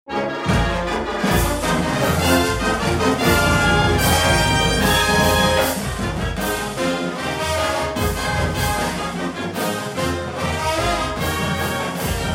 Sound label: fight song band